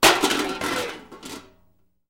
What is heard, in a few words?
Metal Debris Impact Settle